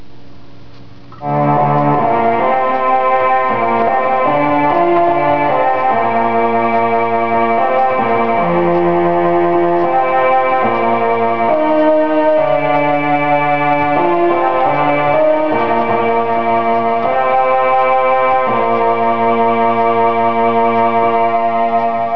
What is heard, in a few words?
arp violins, mellotron